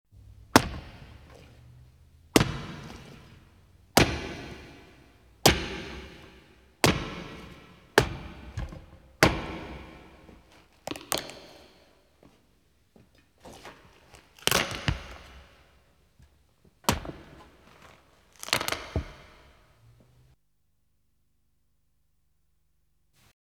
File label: Punching & Tearing; Halloween Sound Effects